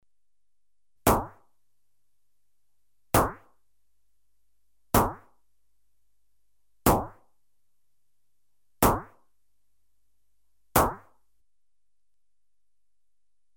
Pump action rifle (6 single shots), Electronically Generated Sounds; Sound Effects Library